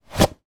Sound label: household woodburner fire proof glove take off